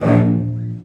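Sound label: CELLOS C4, Strings; ILIO; Synclavier Strings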